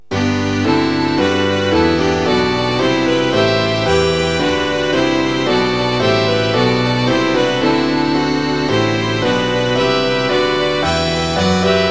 westfaelische taenze intrada orchesterfassung

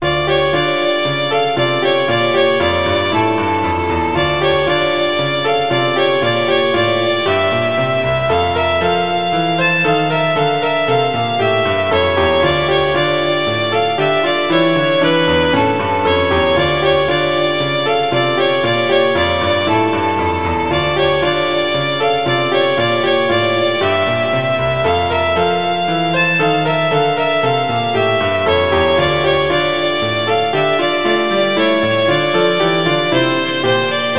violine u klavier; spielmusik

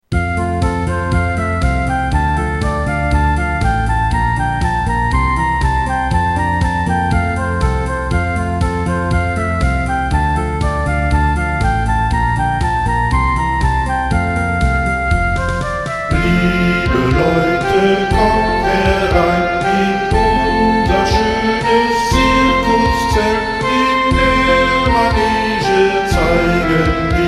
zirkuslieder liebe leute kommt herein kanon

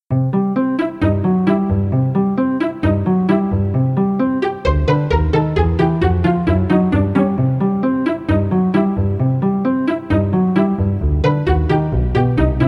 zirkusmusik

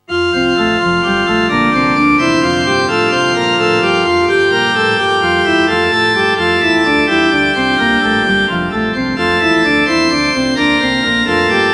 nun danket all und bringet ehr, orgel

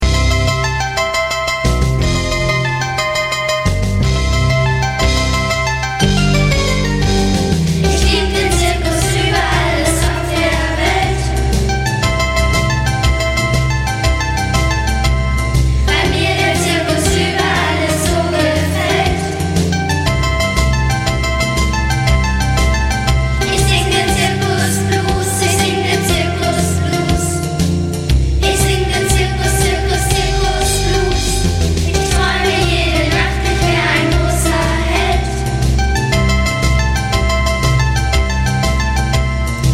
zirkuslieder zirkusblues

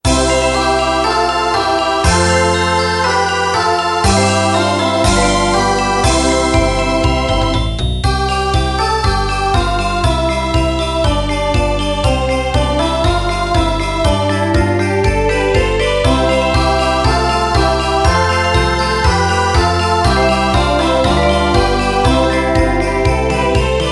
schulmusik alle jahre wieder playback

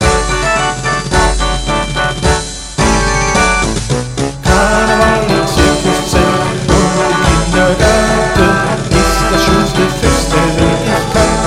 zirkuslieder

